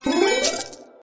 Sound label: big win